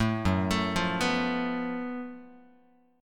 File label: F sharp Minor Major 7th Double Flat 5th, Guitar; arpeggiated